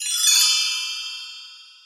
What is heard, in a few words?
chime shot